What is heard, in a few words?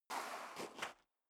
step high heels gravel light scuff; schritte; Footsteps; menschen